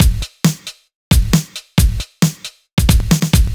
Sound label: 135 No Hats, Drum Loops; Zero-G; Soundclash Nu Dance Electro; Acidized Wavs; 135bpm; Boom Selector